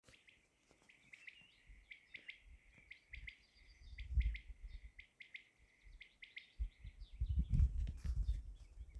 ukjent fugl

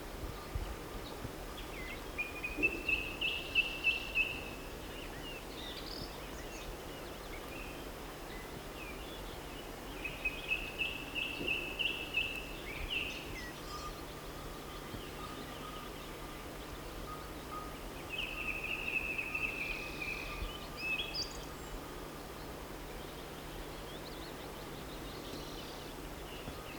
fuglesong

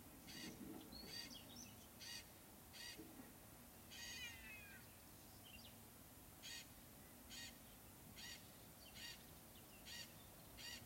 nattfugl

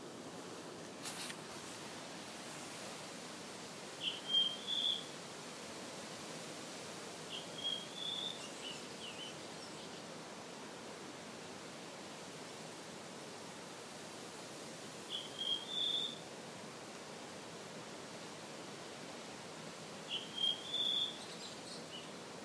fuglesang

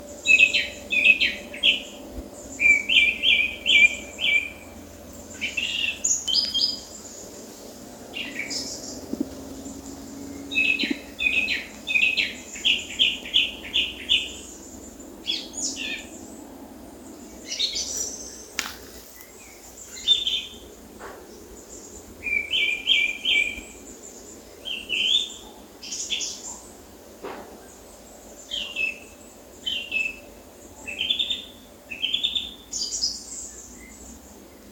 ukjent fuglesang

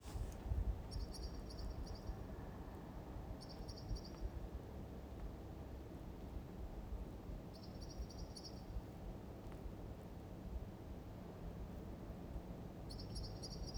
fuglekvitring i oslo

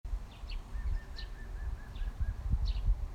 fuglelyd

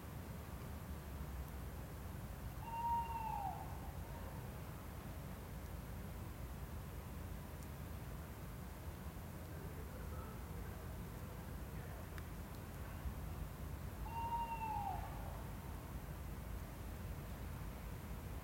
uvanlig fugl; nebbestolen

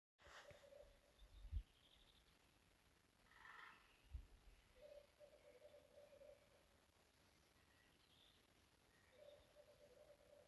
dyrelyd

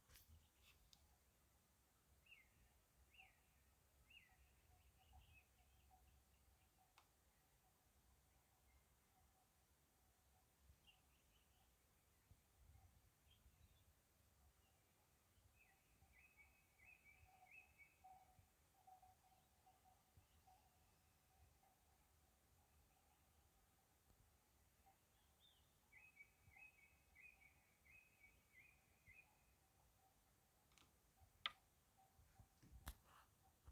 fugl

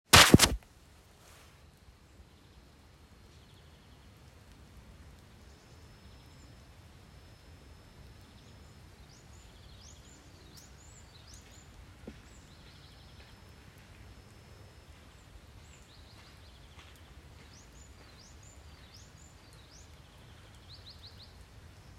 trillende kvitter

